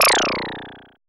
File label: JNO BURBLE, WARBLES; Drums In Your FACE!